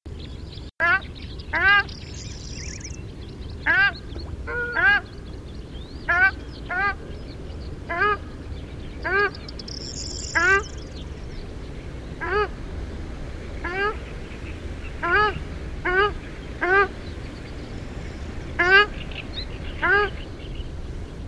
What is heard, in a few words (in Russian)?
ogar golos